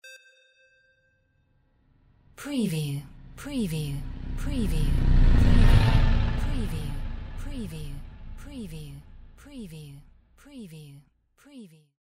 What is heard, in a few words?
sci fi spaceship passby; windy beach audio